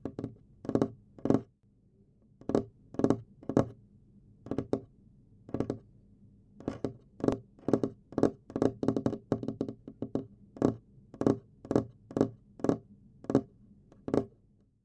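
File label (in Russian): пальцами по столу стучат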